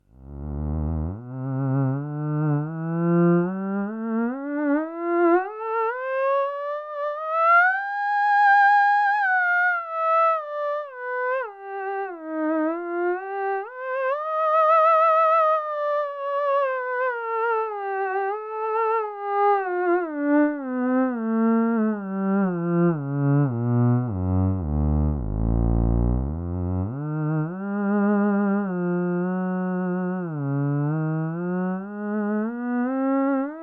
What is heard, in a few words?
ews resonator, theremin